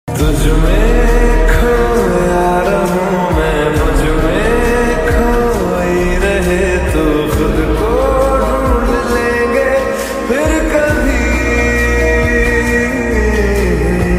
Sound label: slowed and reverb lofi